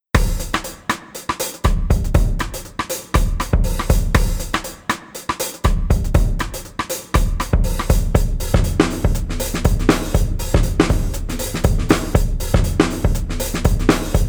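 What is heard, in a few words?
Street Drums Excited, 120 bpm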